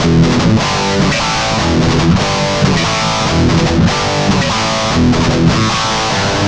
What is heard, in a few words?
Guitar Licks